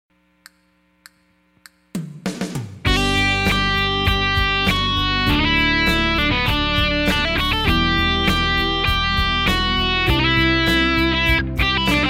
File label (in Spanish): clave 5 notas ritmo diferente